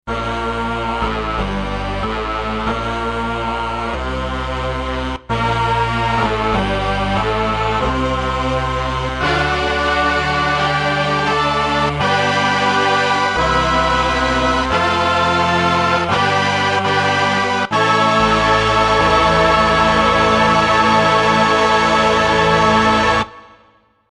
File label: brass string section moog bass 8 choir